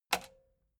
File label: metal button spring click fast